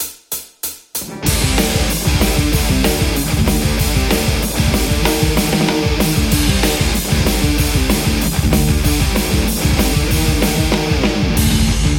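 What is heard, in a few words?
metal Telecaster; rockerverb50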